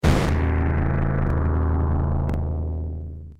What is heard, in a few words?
gameover death